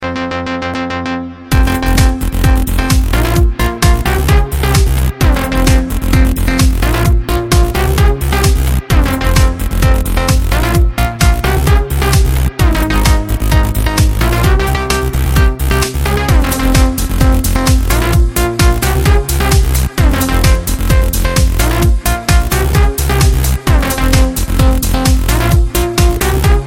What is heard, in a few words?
electronic tone